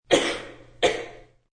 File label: Lady Coughs Twice; Sick; Human